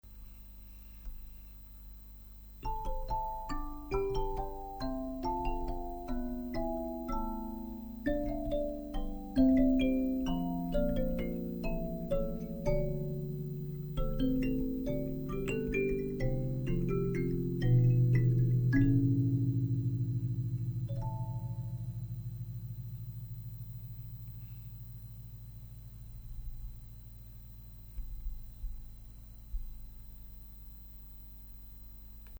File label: kalimba